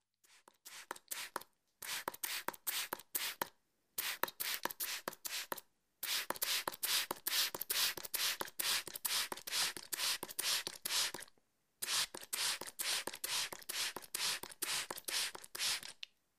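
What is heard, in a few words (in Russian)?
распыление воды спрей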